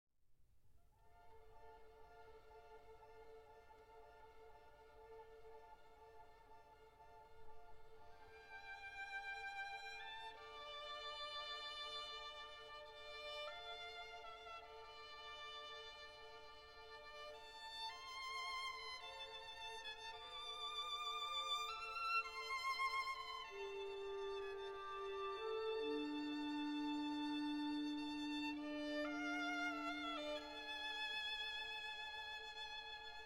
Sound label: Violin Concerto in D Minor, Allegro Moderato